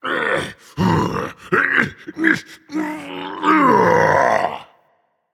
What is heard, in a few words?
Grunt Lift, Dragon; fafnir